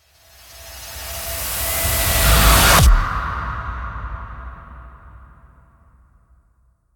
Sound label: Main Ambient; Blood Harvester; Doom Eternal